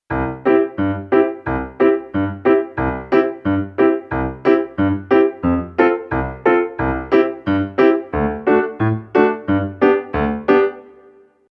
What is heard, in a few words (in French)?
musique trad